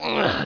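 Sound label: CLOWN GRUNT, male grunt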